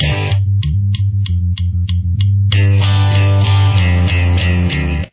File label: full verse